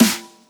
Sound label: Snare DNB Long; pitchglitch